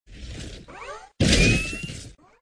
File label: repulsor blasts